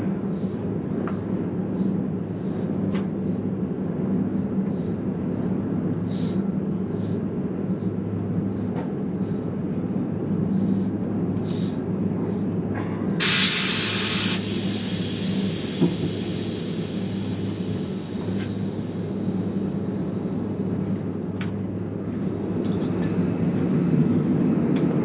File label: Eurostar door sounds